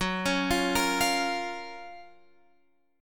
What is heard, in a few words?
F sharp Major 7th, Guitar, Open F; F#M7 Chord; arpeggiated; x,13,13,12,13,12